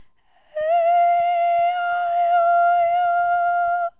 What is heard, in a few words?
aeiou High; A E I O U Sung in the high range